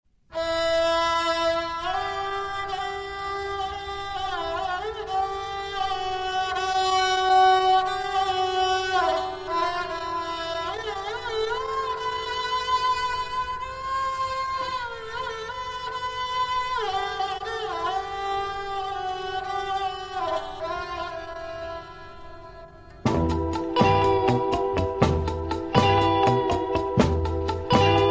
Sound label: Instrumental; Skybird Radio; WRMI